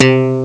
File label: Plunk Bass; Amiga Soundtracker Sample Packs